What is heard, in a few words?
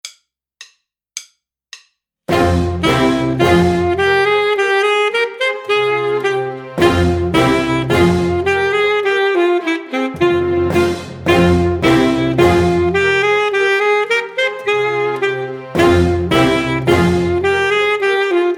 Duo In G Minor Teacher Sax